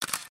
Camera Shutter

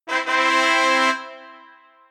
Tadaa

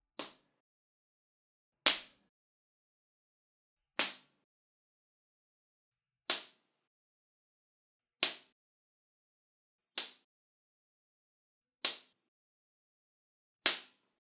Belt

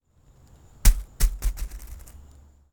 Bouncy Ball Bouncing On Dry Grass

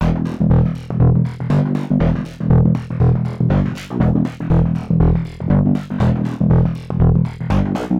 Hyperbass Sequence

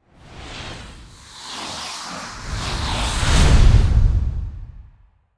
Long Whooshy Transition

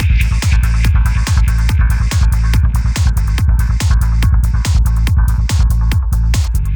Psychedelic Trance Beat Loop; Elves Step; Full On

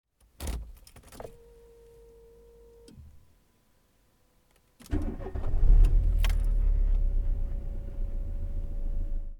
Starting The Engine With Keys

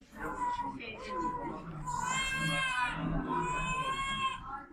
Crying Baby

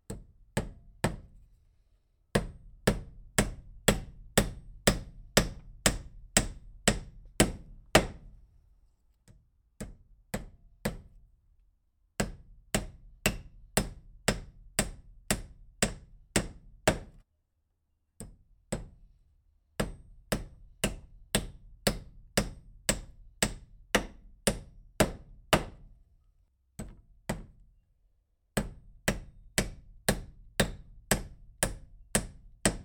Carpentry Hammering Nail

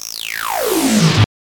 Rise

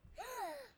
little girl gasp

